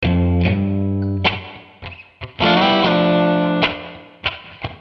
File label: 98 blues rhythm